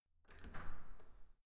entrance door open soft quick

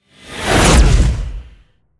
promo pad; whooshes rises

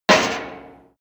impact metal bucket; maschinen; soundpool

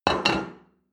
plate place table hard

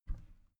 bathroom cabinet creak open soft; objekte; tueren

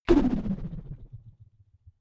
whoosh rubber band vibrate soft; swooshes-swishes

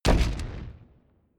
wood stack bass; whooshes rises; hits impacts

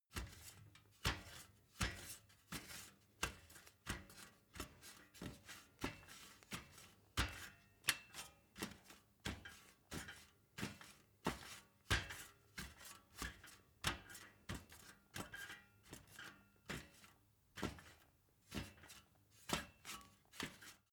step ladder metal soft; schritte

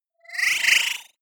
sci-fi tumble; swooshes-swishes

